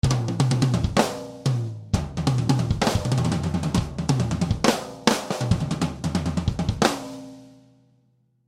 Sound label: Drum Beat Tribal, Drum Beat Tribal Rock Tom Groove; Drum Kit Sound Effects